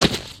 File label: gravel, human step